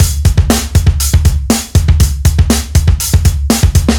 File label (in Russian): Deep rooted, ударные и барабаны rock alternative